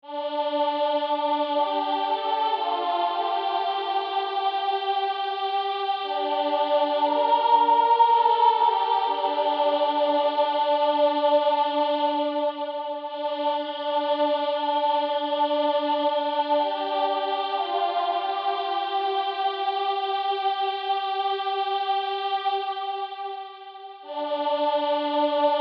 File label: 4 vx Training Alto; Argizaiola; J. Guerrero